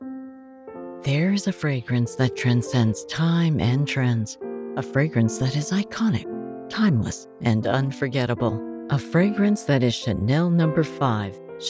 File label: Music; Chanel No. 5